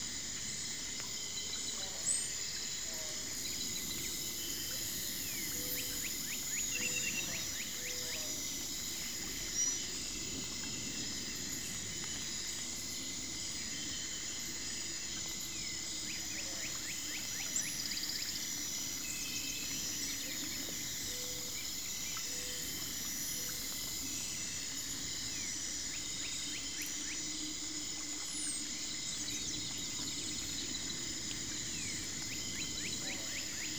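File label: sfx ambience rainforest tree; chicory a colorful tale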